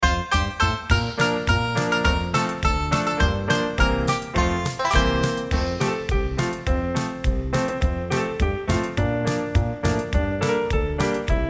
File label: Jingle Bells